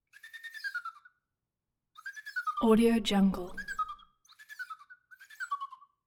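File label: interior canary whistle; game sounds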